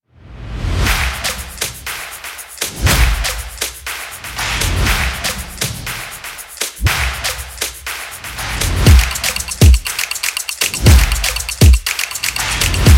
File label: stomp rap adrenaline beats